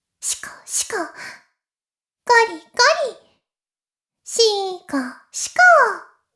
妹 しこしこ音声; 妹めしこしこ音声

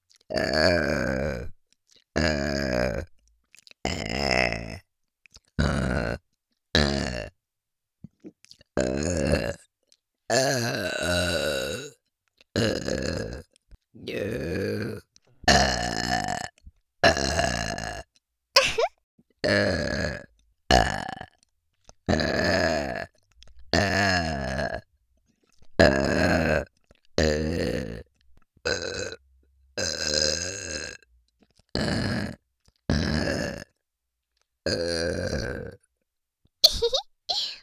ゲップのみ; ハナ

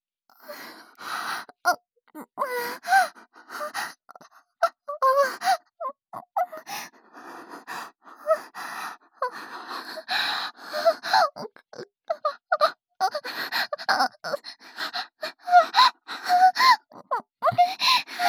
少しずつ感じ始めていく喘ぎ声

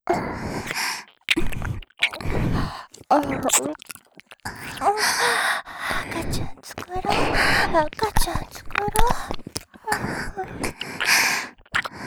耳舐め喘ぎ; 赤ちゃん作ろ

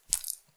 くちゅ音単発, ASMR

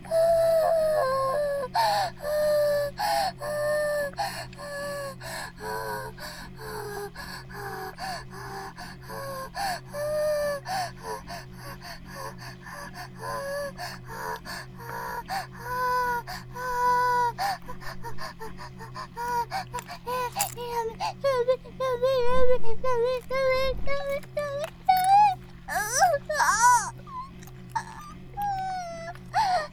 バイブ放置; イクたびにわけて

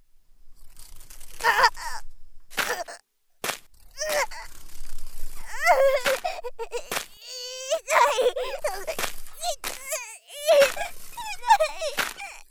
入れた蟲がうごめく

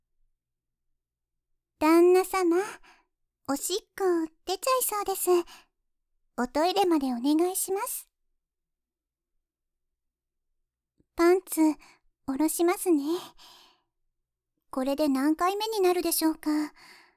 おしっこ

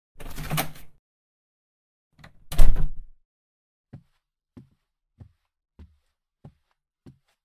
手作りチョコ、一緒に食べよ♪（食事音:チョコ）ASMR

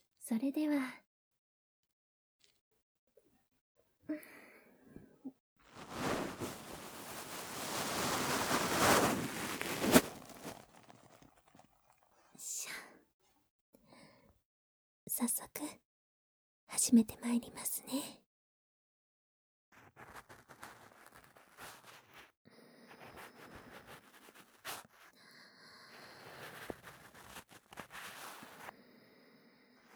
左耳かき, ASMR

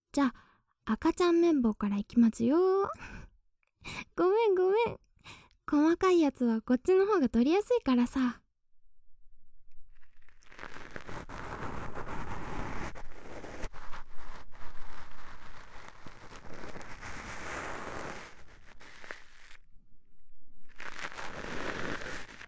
赤ちゃん用綿棒 右

